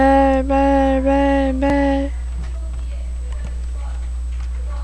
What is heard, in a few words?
bell